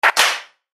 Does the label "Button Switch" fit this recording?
Yes